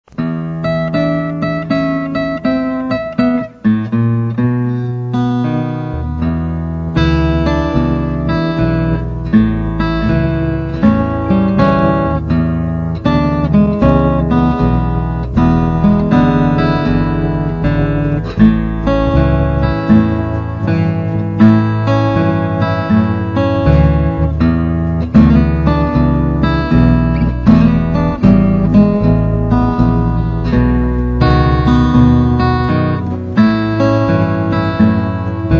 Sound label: guitar